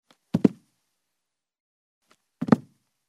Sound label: Jumping barefoot on a carpeted wooden floor; steps